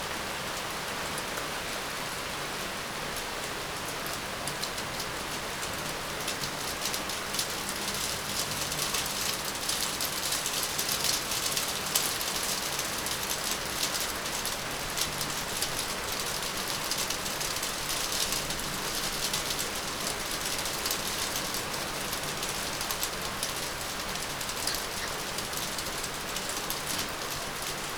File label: Rain on balcony with booming sounds; SFX; IRL Recorded; Rain - Thunder